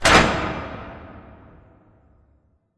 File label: cooling panel start; Titanfall 2